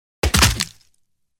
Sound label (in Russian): udar i perelom